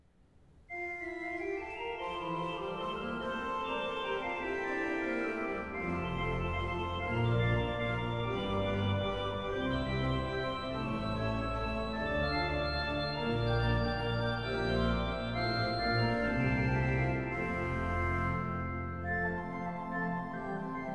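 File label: Prelude, in F major, organ; J. S. Bach; Piet Kee Plays Bach, Volume 3; Eight (short) Preludes and Fuges; No. 4 in F major (first version), BWV 556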